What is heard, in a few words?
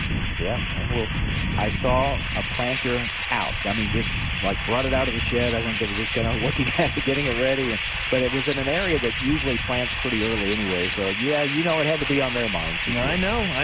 loading grain bin radio severe with tinnitis